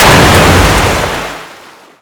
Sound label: Car Into Water; cars, effects; Totaled; XBOX